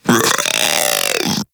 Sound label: Infested Splitter Split Top Vocal Attack Medium; Warframe; Grey Strain